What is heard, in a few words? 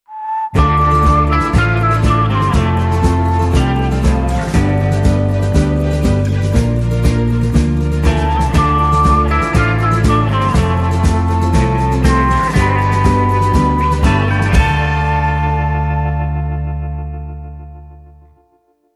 Wild West, Jingles